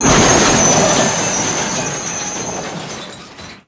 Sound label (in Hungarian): Mozdony Utkozes; Pure Pinball